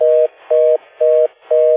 Phone Busy

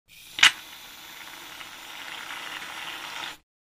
shaving cream; casa

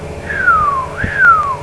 WHISTLE, fischi; persone

